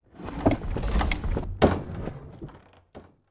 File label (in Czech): zebrik; Mafie 1